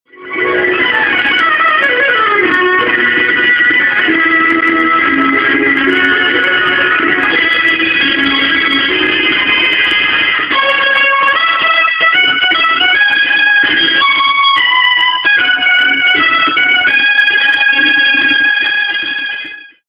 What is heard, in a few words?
music mobile song; don chuck monogatari